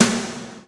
Snare, drum hits; Zero-G; Soundclash

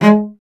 STR VC G#2, Cello; Miroslav Vitous; Solo Instruments VOL-1

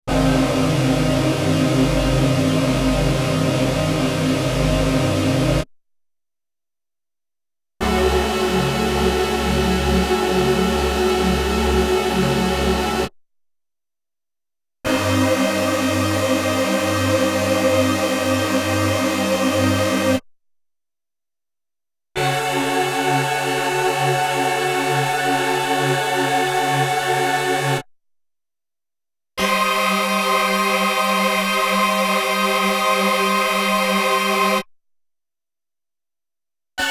dance & techno, lead; Inspiration Zone

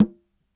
Sogo Palm mute; Sony CS

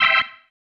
Stab, Organ, One Shots

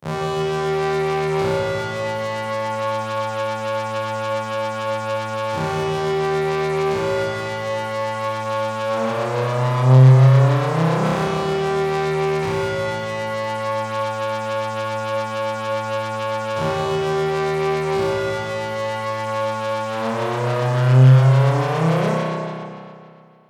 Melodics; neuro; Optiv & BTK

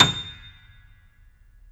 JAZZ A0, Keyboards Acoustic; USB Soundscan vol.17

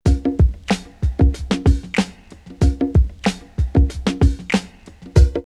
super fly 94, drum loops, old school; X-Static Goldmine

